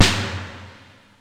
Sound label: Snare, Fairlight CMI III